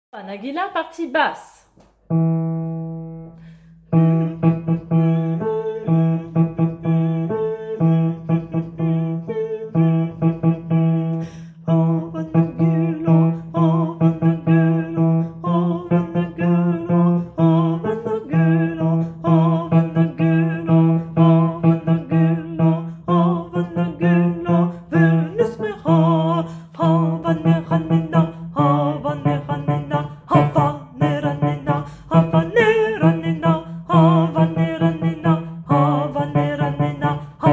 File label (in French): partie basse